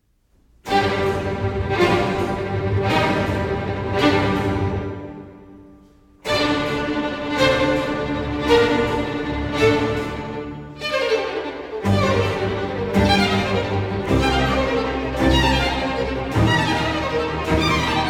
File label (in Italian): Presto tempo impetuoso